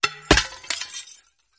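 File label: Fluorescent Bulb Break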